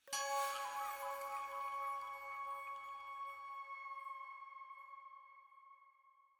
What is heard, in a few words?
item Jingle Flute Chime C sharp